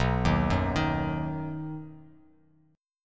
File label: B Major, Bass Standard; arpeggiated; 7,6,4,8; JGuitar